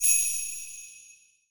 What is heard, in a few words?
bell; anime